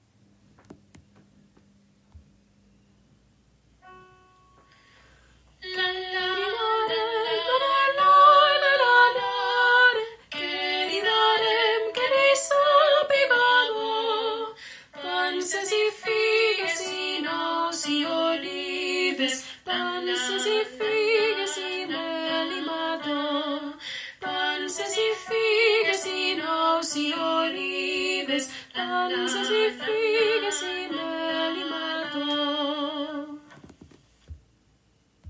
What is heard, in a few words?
soprano